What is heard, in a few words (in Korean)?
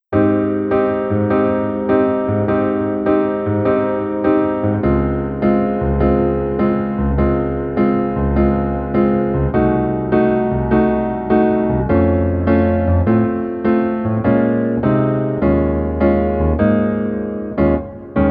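Piano version -1키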